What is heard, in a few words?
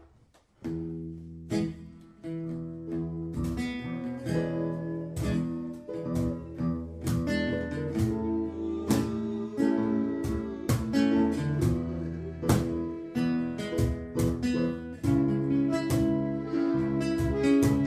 fast train